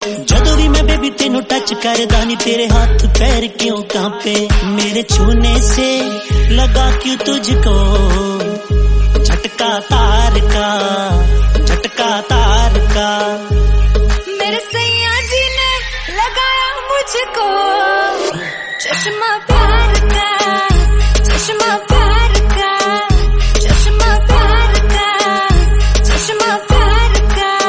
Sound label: Hindi Song